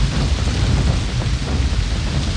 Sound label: waterfall